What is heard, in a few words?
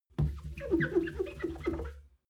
clean window paper towel rub